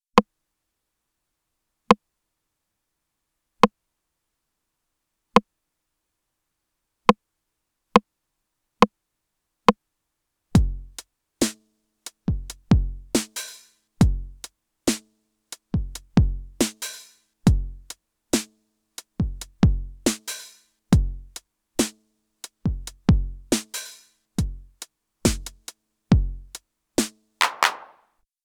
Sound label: Sony DRE 2000 TR909 Dry